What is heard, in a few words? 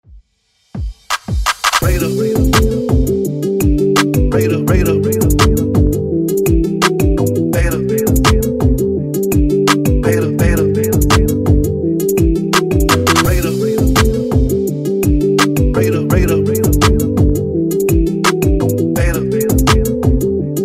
Kpop Redrum